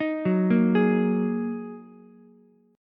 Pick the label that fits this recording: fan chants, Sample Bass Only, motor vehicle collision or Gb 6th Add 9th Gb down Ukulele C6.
Gb 6th Add 9th Gb down Ukulele C6